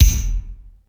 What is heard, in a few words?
METAL KICK, effected drum; Crypton - DrumBase5000